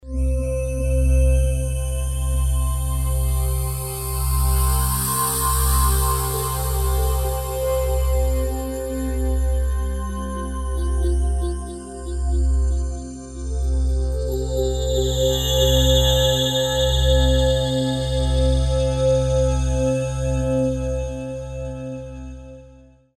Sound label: Be Patient Pad